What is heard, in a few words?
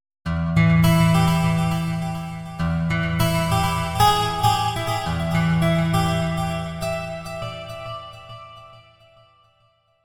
crossed signal